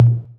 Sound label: Tom, Drum Percussion Single Hits; Zero-G; Nu Disco